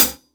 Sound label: HH CL, Drum 1 Dry; East Collexion